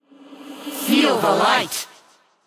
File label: Activated Dark Long Arm Of Light